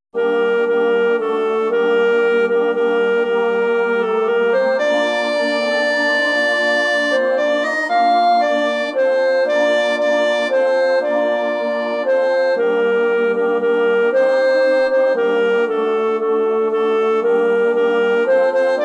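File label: Cantate Domino, G minor, Tenor Instrumental; Pitoni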